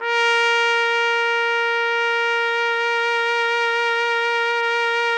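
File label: TPT, trumpet; Roland - LA Composer vol. 3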